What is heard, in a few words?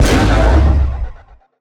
bot dash; mine; battle